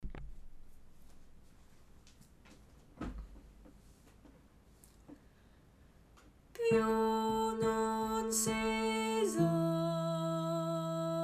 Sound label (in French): basse; Piu n'un si sà